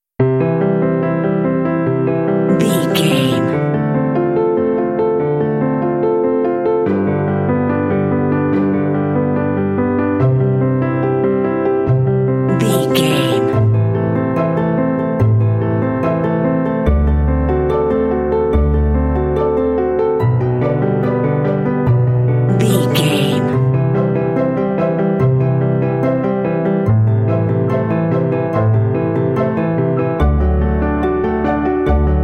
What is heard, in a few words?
pretty piano song